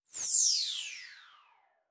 Multimedia Internet CD-Rom Flash Whoosh Short

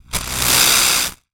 Transition Noise Static; Production Element; Title Transition; AdobeCC

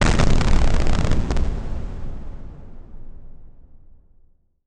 Title Transition Burst; Adobe; Production Element

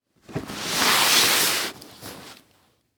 Impact Body Drag On Cement; Impacts

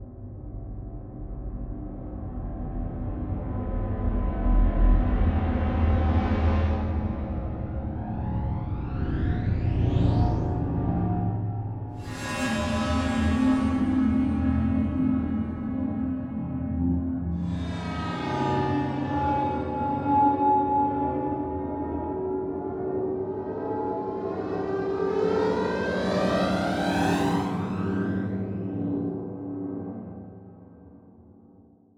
Slow Pulse; Module Production Element Title Imaging; Imaging Elements

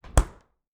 Refrigerator Door Close, Household

